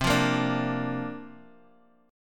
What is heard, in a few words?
C 9th Suspended 4th, Guitar, Half Step Down